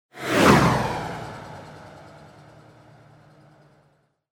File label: powerful flyby whoosh; sfx; overte; partyBall